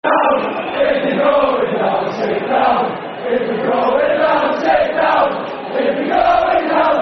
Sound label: Sit Down If You're Going Down [Football Chant (Soccer Songs)]; Preston North End Pne